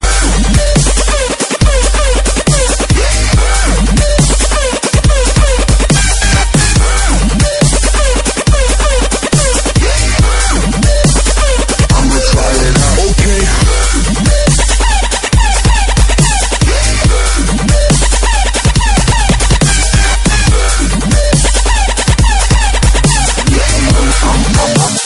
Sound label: dubstep song; black ops 2